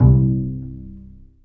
Bass Piz A C1; Digital Sound Factory Virtuoso

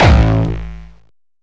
Hard style kick

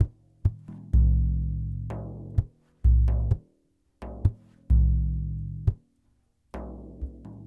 Lg Frame, World Percussion

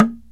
PERC KALIMBA

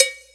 perc, Percussion; Trance Explosion Vol1

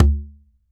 CONGA BASS; AKAI S6000 CD-ROM - Ultimate

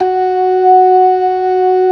LOW MAN, organ combos; Keith Emerson Signature Series

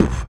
Human Perc, Body Perc

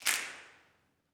snaps, gospel; ILIO; Vocal Planet

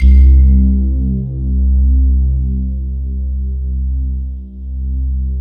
JV HUFF N ST; Syntec; Wall of Sounds

